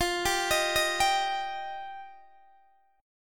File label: F 7th Suspended 2nd F Guitar DADGAD; F7sus2; arpeggiated; x,20,17,20,18,17